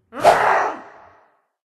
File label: boss elf attack voice up